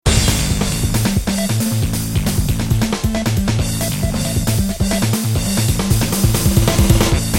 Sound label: Roland MC909 breakbeats